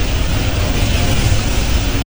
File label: arc loop, weapons